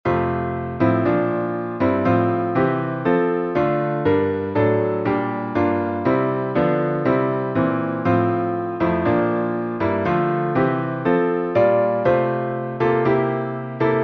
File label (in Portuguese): salmo instrumental